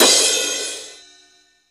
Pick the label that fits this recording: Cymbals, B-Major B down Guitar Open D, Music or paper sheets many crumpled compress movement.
Cymbals